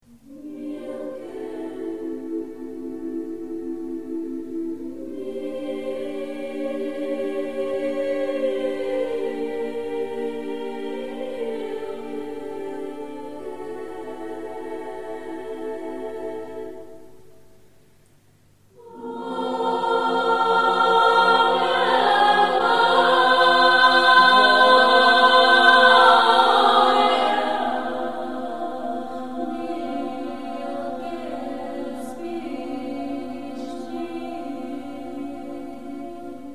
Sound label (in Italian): Voci Bulgare Esempio armonia; Cori Polifonici